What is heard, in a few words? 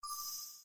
brick; breakout